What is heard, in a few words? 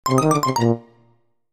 funny game over